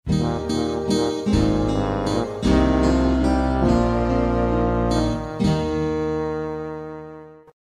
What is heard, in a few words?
sad theme; swords and sandals 2